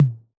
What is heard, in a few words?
Tom, Yamaha DJX 2B